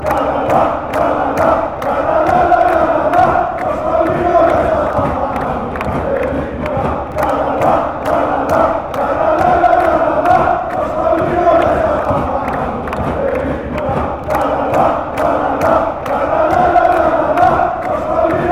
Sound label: olympiacos chant; ofc